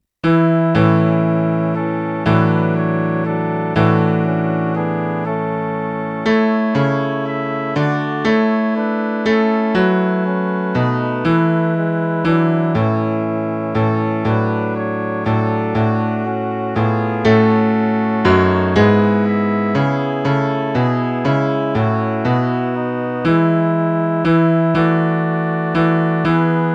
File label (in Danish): midi